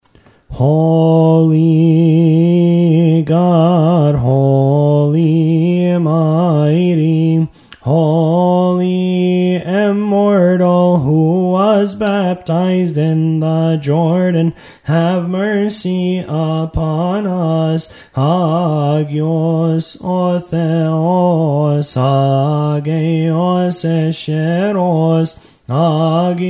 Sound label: trisagion, hymns